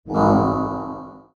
times up

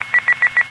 rocket beep

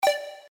bet decrease; ThreeHeadedDragon

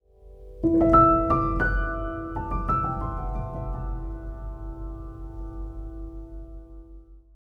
loop piano

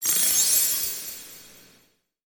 click of coins; my Buildings; City Verse Tycoon

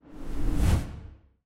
scene change back; spellbound

